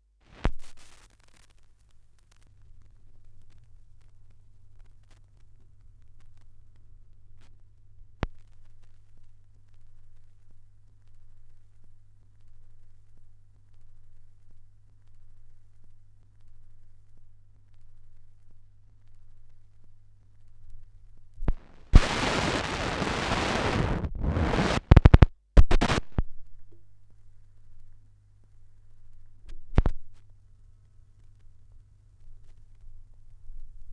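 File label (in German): schallplattengeraeusche, record noise; wendelrekords 1.1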